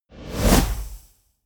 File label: whoosh